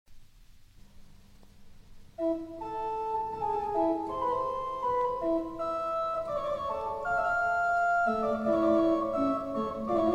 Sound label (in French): Pièces d'orgue; Guilain J.A.; pour le Magnificat, Suite du quatrième ton